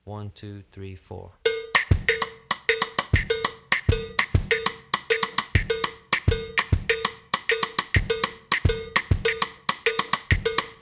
songo basic

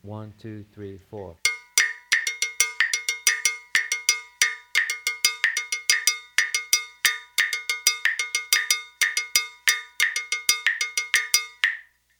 mambo bell pattern

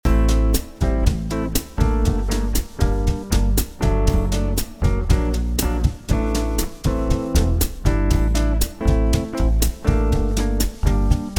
dorian jam track loop

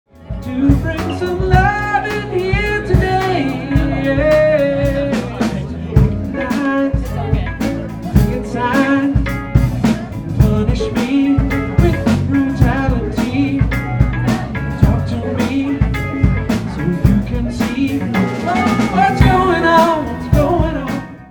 WIND DOWN

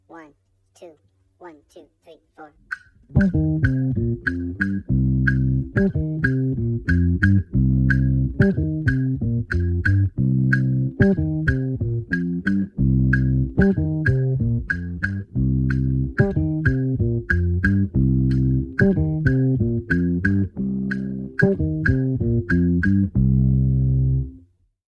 bass songo

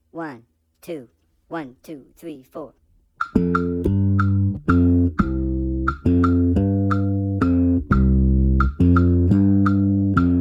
bass songo slap tumbao

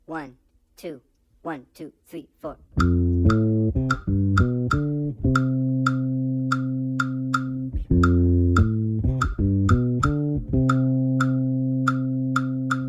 bass songo

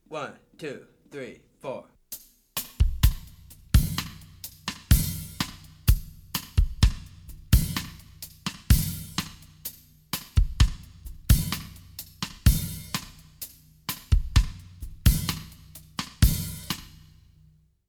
cha cha cha variation

